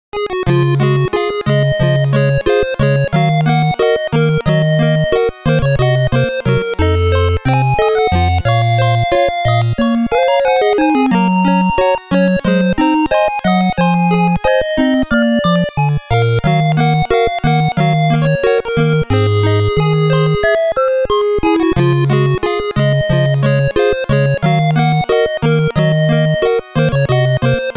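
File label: FM synth music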